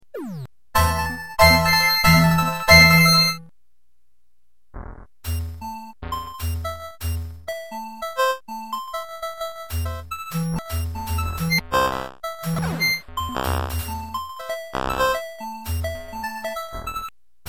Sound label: 8Bit